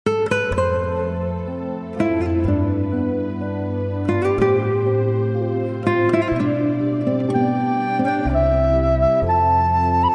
Clarinetes; Clarinetes II; O God, Our help in ages past

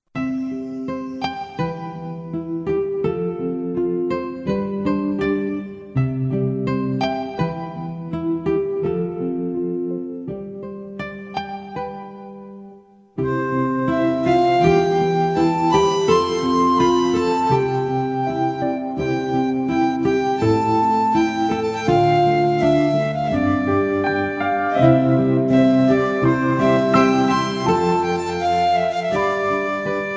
Organos; Organos III; Cantad alegres al Senor (arreglada)

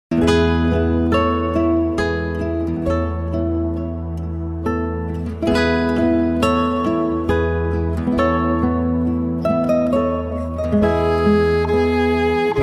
Clarinetes II; Jesus, The very Tought of Thee

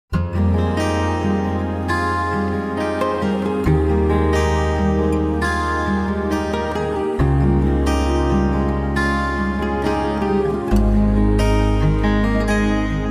Violines; Ni en la tierra ni en el cielo

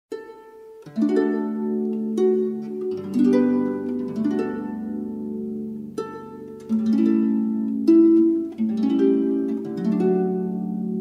Cuerdas; Estad quietos y conoced

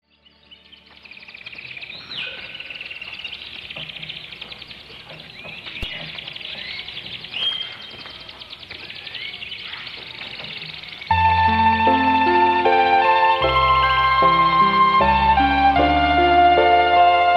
Organos; Organos II; Fija tus ojos en Cristo

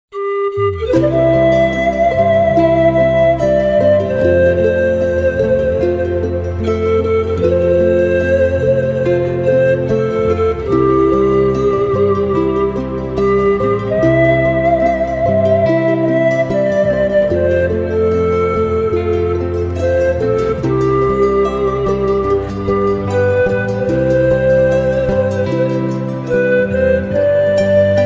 Flautas; Flautas III; Yo te seguire oh Cristo

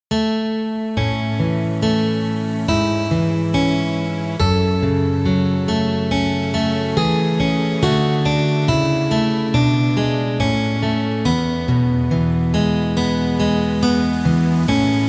Organos; Organos I; Rejoice, The Lord Is King

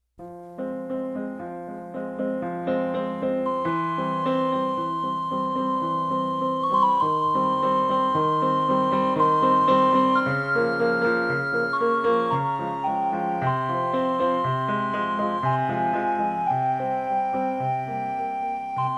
Violines; Violines III; Flee as a Bird

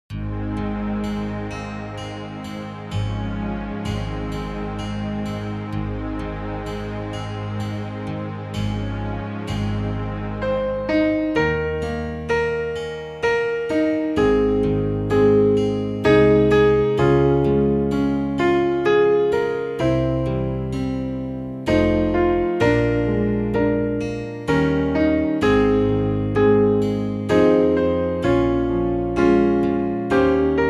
Pianos; Jesus Calls Us

